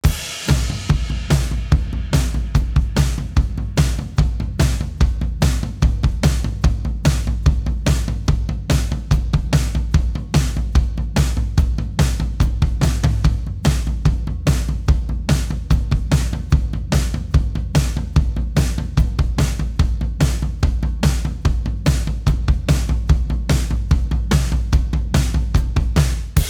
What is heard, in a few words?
Drum Buss